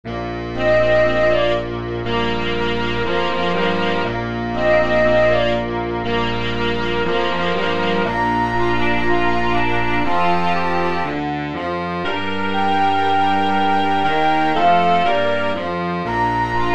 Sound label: sans trumpet